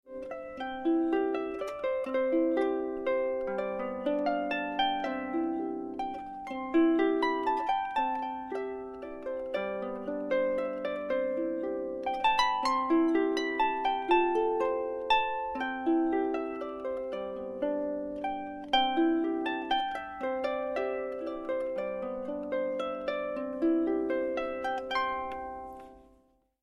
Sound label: 22 string harp